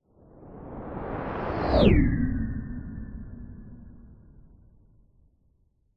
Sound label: science fiction spacecraft medium sized electric fly by whistle hum fast; zapsplat